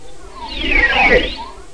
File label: Enter Movie Woosh; Let's Discover Michigan!